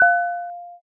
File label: tear